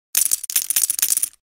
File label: Poker Chip